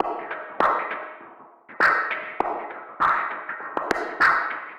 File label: Ghost Loop 100BPM, drum loop